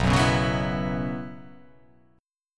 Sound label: B Suspended 2nd Suspended 4th down; strummed; JGuitar